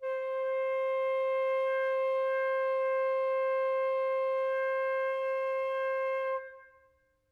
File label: flute; interpolator